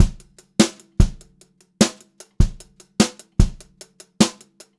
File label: JZ BEAT, Brushed Drum Loops; USB Soundscan vol.39; AKAI